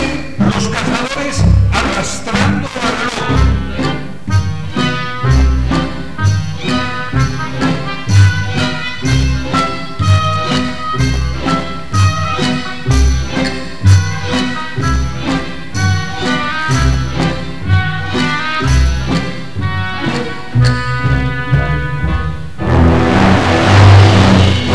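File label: natural trumpet; Sergei Prokofiev: Peter and the wolf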